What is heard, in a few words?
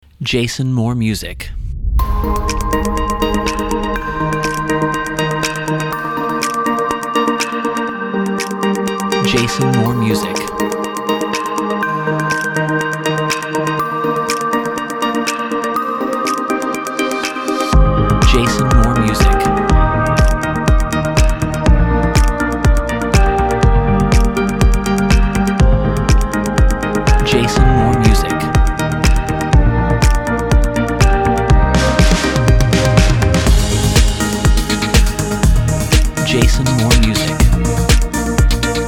Smooth Whisperings 122